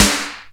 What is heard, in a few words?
Snare, HR16, drum hits; Zero-G; Soundclash